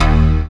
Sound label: SYN SUSPI, dance synth; Sound & Vision - Gigapack I CD 2